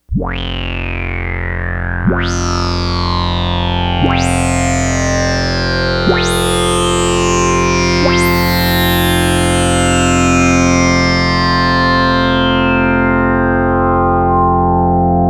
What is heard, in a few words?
Prophet 5